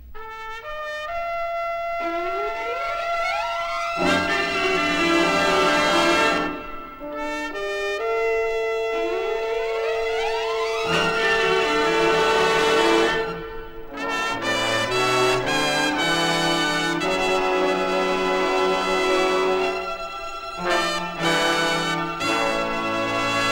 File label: Fanfare